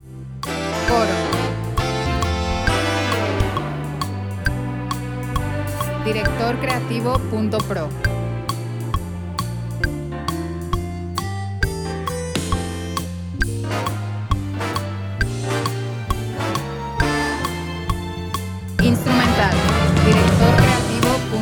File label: 134bpm 4 4 F